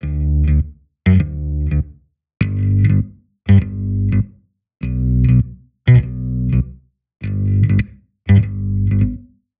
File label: Dmaj Bass Stringed, 100; Zero-G; Future Wave